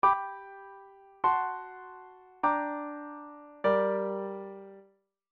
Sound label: CHORD PROGRESSION